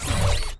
nanobots bounce; Tanks

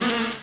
beesting; Tanks

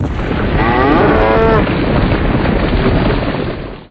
stampede moo; Tanks

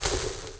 fizz bombs; Tanks

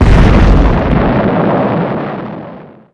fball explong; Tanks

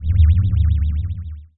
saucer launch; Tanks

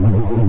phasemissile; Tanks

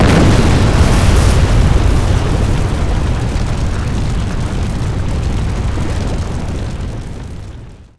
meltdown erupt; Tanks

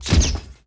smokebomb launch; Tanks

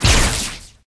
sidekick hit; Tanks

